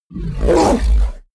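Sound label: fishman groan, creatures; kreaturen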